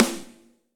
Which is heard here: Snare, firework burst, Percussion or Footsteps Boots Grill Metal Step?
Snare